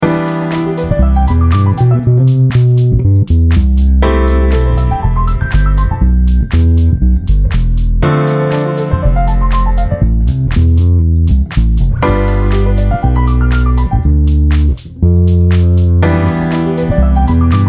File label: bass Cmajor, playing the IV chord (Fma7) when the keyboardist plays the ii chord (Dmi7); a bassist's guide to chord substitutions